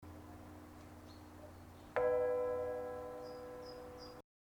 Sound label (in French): cloche; Blaincourt sur Aube